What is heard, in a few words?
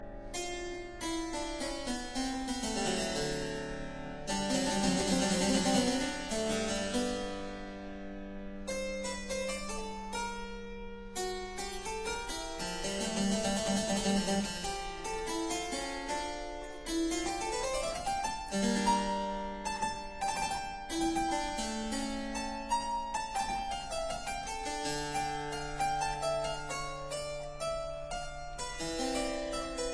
Prelude in D minor; L. Couperin